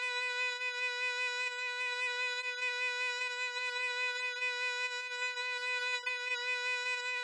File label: synth